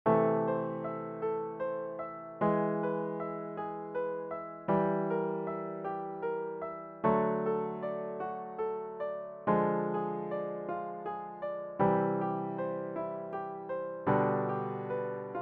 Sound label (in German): Akkordfall